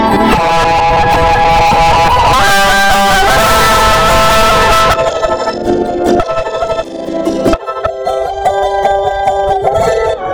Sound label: charango after; friendgineer labs